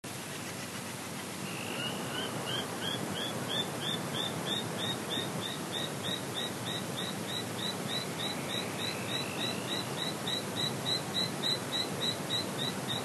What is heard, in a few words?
Grallaria andicola